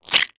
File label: human mouth saliva movement; sound effects one